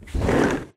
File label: Balcony door; Five Nights at Ronald's